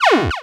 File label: Cry